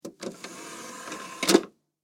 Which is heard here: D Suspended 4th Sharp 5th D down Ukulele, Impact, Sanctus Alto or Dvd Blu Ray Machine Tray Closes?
Dvd Blu Ray Machine Tray Closes